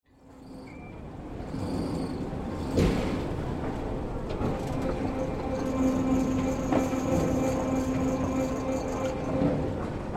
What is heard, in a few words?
groaning giant metronome